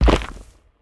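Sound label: large step; Smite; Cabrakan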